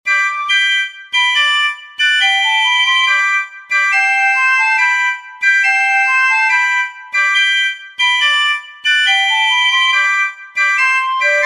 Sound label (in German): Morgestraich mit 4ter Stimme; 1.Stimm 2.Stimm 3.Stimm 4.Stimm